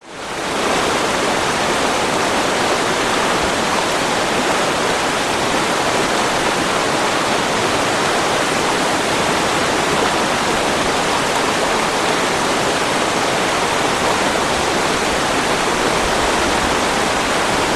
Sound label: Water Fall; Audio Hero